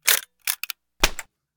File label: gun jam